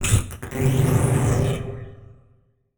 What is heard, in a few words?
Title Transition Electrical Zap